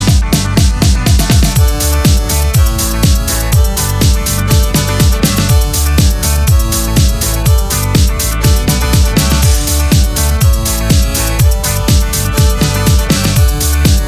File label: Dub Mix